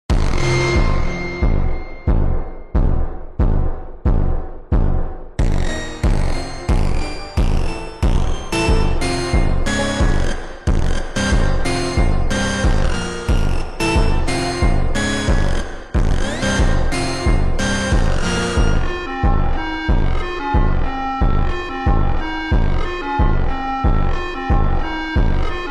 monster funk

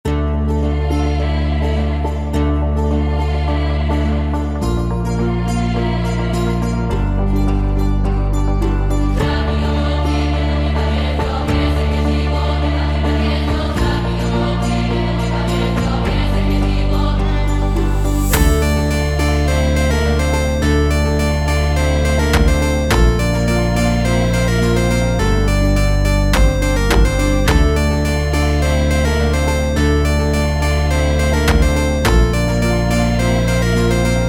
slavic chorale funk

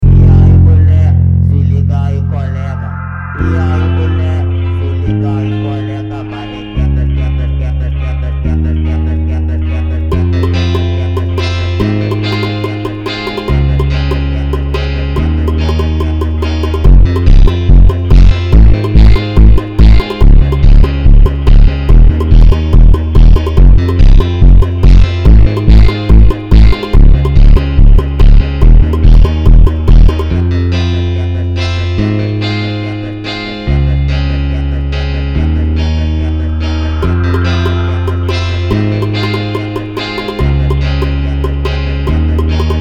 FUNK (SPEED)

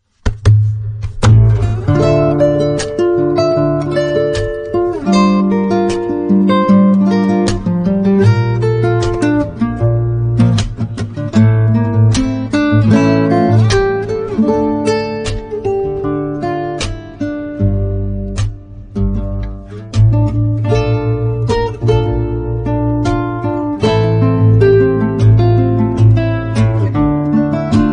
ba gitar

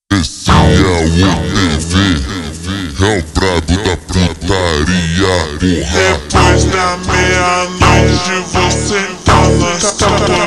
mega slowed

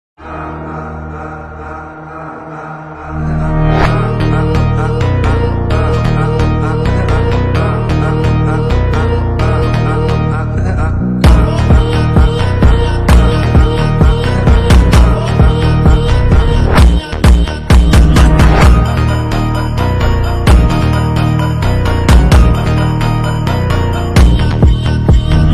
Brazilian Funk; Famous

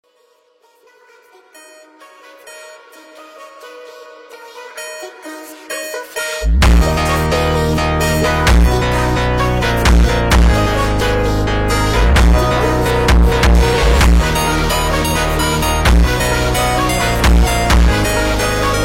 Phonk Funk

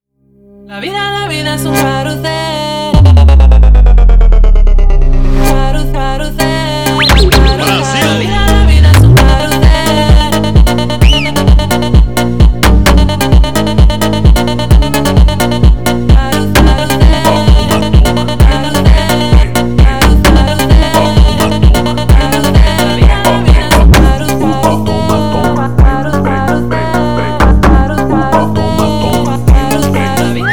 phonk funk

